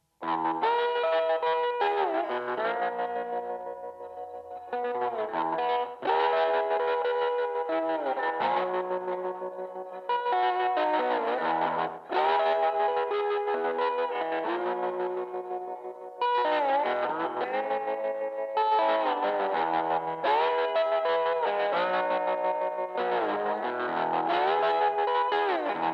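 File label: hodad pick slide gain echo tremolo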